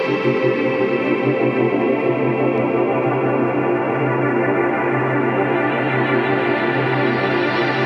122 BPM Pads Amaj Gmin Tonic C, ambient synth pads; MMB